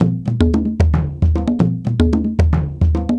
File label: African Drum 75 bpm; Quazibeat; African Secret; African Drums